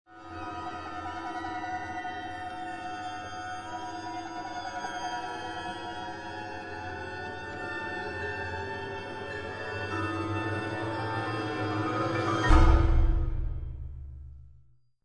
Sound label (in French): cuivre